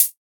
clean hat sound sample f sharp, Royality free hat sample tuned to the F# note; hat samples